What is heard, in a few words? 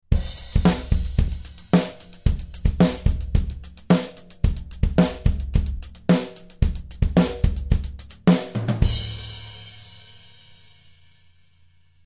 16th beat